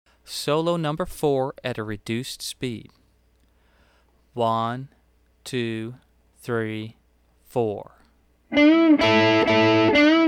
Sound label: Solo 5 Slow